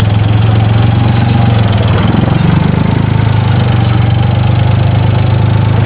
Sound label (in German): Traktor